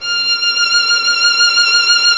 violin, Solo Instruments

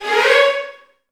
VN FX, Virtuoso Strings

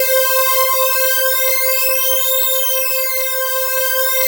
PHAT ACID C6; PHATYACID

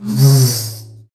Tamorra, Roland BK-5 Percussion

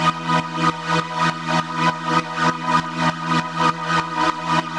Pad alesis 100 E, sidechained

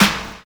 SD, snare, 70 s Breakbeats

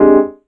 CHORD, 160 BPM, Drum & Bass; Ueberschall; Drum & Bass Flash Trackz

